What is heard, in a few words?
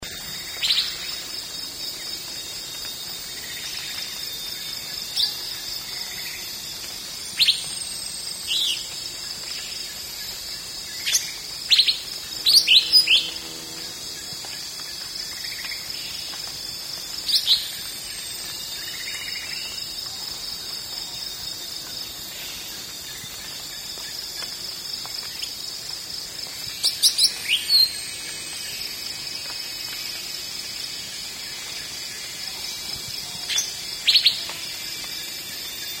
cucak ranti blue winged leafbird chloropsis cochinchinensis